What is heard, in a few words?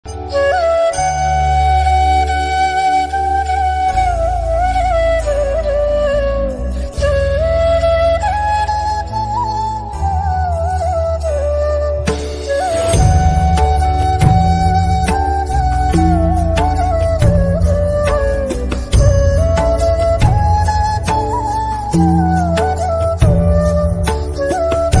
shri ram chandra kripalu ringtone ram flute ringtone heart touching ringtone